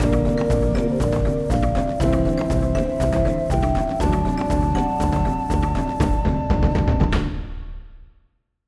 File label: Win Level; Zuma's Revenge